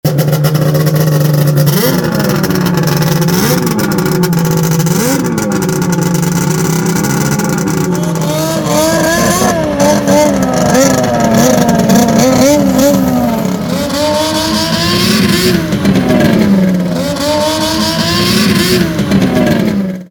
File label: Vmax4 Ringtone; Kelkkalehti MK 4-2024